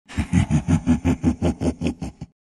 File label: laugh; spirit breaker